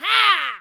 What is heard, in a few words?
Pirate Queen Attack Vocals